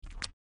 Slide; Lewd SFX

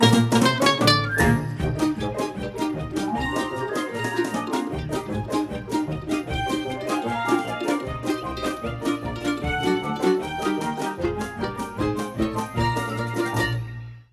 Ticket Song (Instrumental); My Little Pony OST Repository; Season 1; Bonus Music

